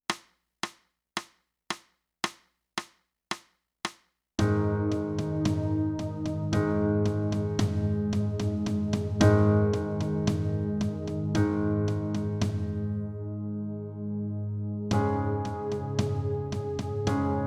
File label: sax